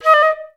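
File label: flutes D#4; Best Service; Peter Siedlaczek's Advanced Orchestra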